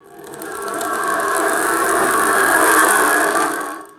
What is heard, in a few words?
metallic pulley rolling on a track; foley